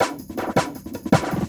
LOOP, 160 BPM, Drum & Bass

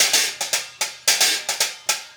Hi Hat Pattern

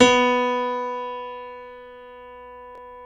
PNO, Grand Pno, Roland

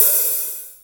HH hh; Best Service Gigapack 2

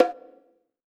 BONGO LOW, HR-16 DRUMS; Drum Mashines; EdgeSounds

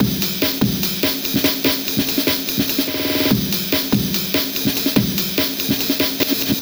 Jungle, Drums & Percussion, Electronica

